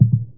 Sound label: heartbeat, ambience